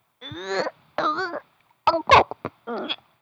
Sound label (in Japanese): ueee